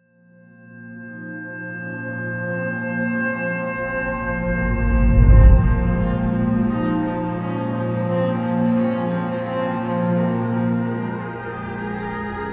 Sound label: celtic atmospheres